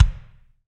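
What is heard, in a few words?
bass drum, Real Kit; Real-Kit s12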